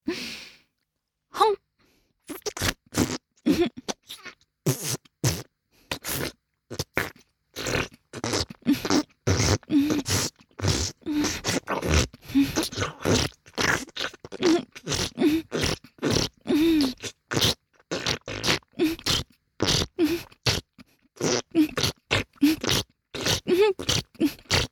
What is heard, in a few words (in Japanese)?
フェラチオ(強)：頭を前後に激しく振って陰茎を深くしゃぶる, ASMR; 実用ボイス抜粋集